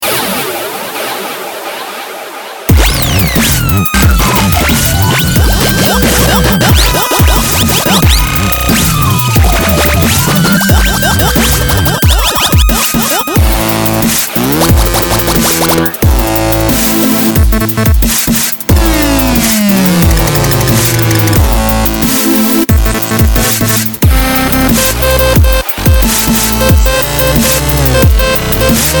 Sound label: Smashing Dubstep